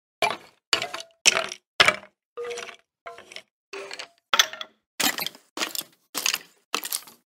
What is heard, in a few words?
skeleton sounds